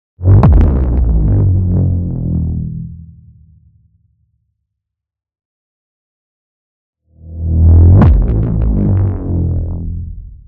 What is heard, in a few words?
BLAST Cyber Terror; NEON; boom library